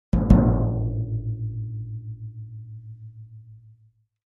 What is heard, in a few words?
Drums Toms C Low Hits D